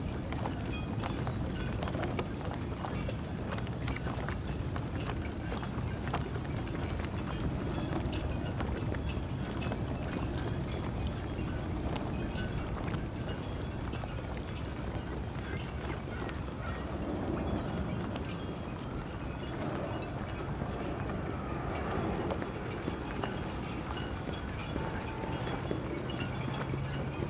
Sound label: Pink Noise, Ocean Jetty Small Light Metal; Relax Meditation Sleep